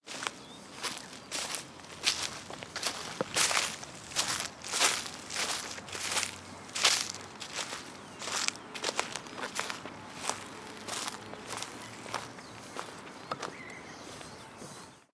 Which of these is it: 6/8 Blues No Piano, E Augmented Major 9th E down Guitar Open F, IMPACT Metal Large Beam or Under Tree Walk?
Under Tree Walk